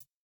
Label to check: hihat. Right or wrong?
Right